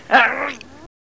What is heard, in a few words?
pain; GoldSrc